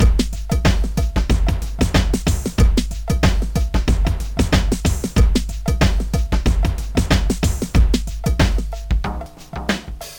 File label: hifi drumbeat; Trackers Modland